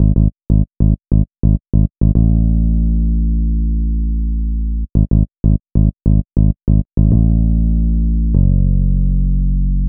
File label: Bass, Hip-Hop